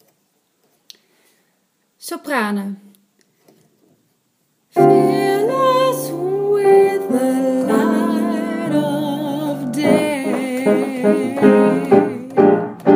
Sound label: Joyful Sopranen